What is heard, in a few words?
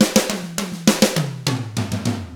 ROCK, Drums; ILIO; Double Platinum Drums 1